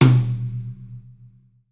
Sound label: New Brush Tom; Roland BK-5; Toms